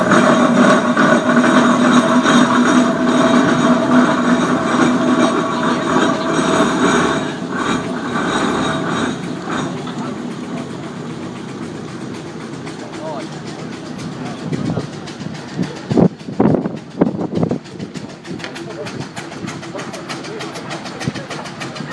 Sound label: threshing engine at cornish steam fair; soundmap